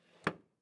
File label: Close Wooden Box